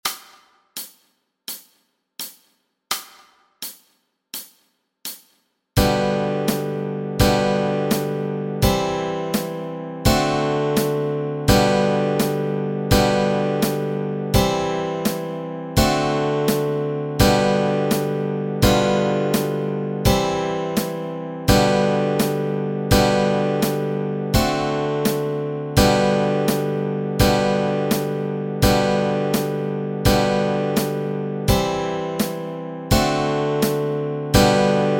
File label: chords, Easy Guitar and Ukulele